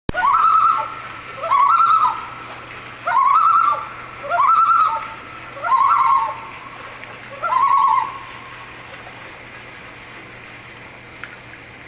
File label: loon chatter